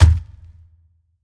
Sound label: step; alien